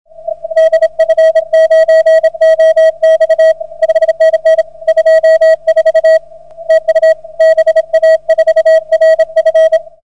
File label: 80m, beverage 60°; HAM-radio; BA4RF